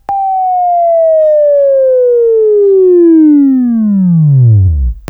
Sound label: WAV Right, Sweeping